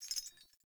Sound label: Movement Chains; Characters; Enemy; Foley; Sinecure; Melee Grunt